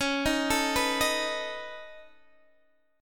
Db 7th Suspended 2nd Sharp 5th Db down Guitar DADGAD; Db7sus2#5 arpeggiated; JGuitar